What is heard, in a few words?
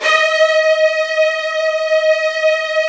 Virtuoso Strings; ILIO; Kirk Hunter Virtuoso Strings VOL-1